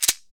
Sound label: SHEKE, Afro Shakers, Shekeres